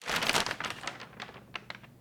Rustle Newspaper Blow; SFX Kit; Foley - Rustle

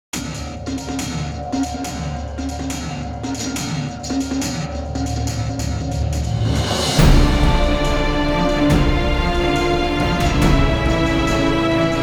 Evil Forces (No Choir); Shockwave Sound - Fantasy Worlds Vol II+III

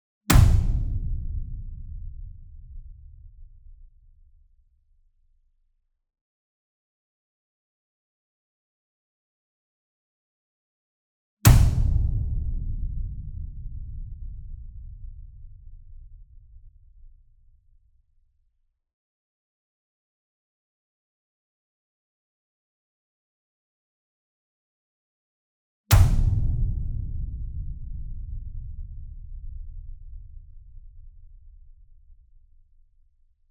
SINGLE DRUM Verdi 66 Inch Hit Whip Beater Near; boom library; Cinematic Strikes Construction Kit

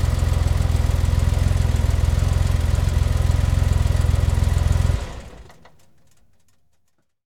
Porsche 911T Off, Automobiles

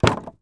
IMPACT Wood Plank On Wood Pile; mono; IMPACTS; Universal Sound FX

